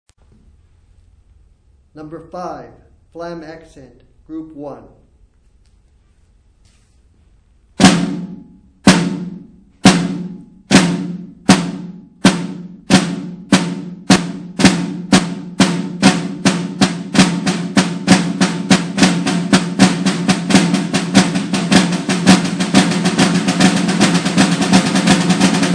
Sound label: snare rudiment